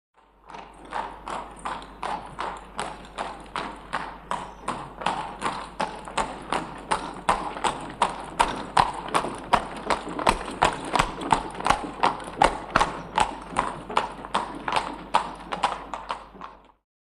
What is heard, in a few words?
By Carriage Pair Up Pa